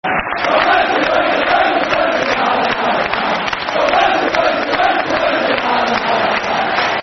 If Ye Cannae Dae The Bouncy You're A Tim, Football Chants; Rangers GRFC